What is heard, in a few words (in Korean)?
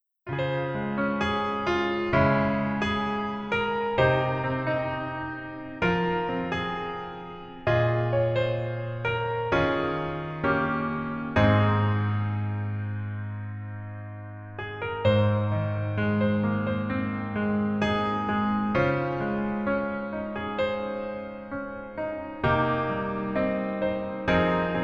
Piano ver, 멜로디MR